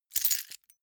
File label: key ring large grab hard